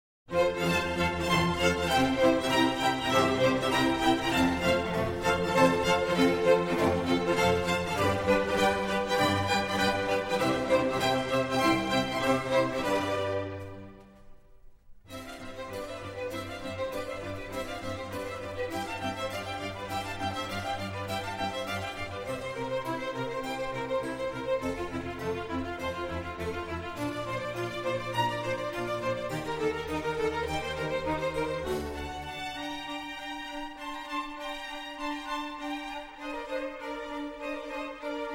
Concerto for Strings and Continuo in F major, Allegro molto; Vivaldi; 9 Concertos for Strings